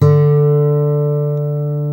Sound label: GUI Ac. D3, GUIT AC; Best Service Gigapack 2